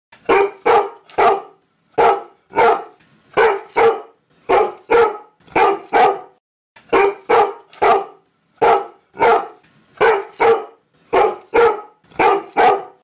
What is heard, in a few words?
bark; woof